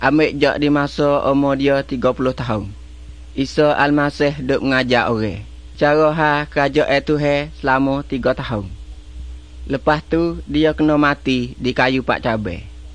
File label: songs malay pattani